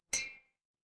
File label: glass clink